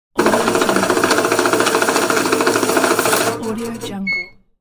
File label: cash counter banknote machine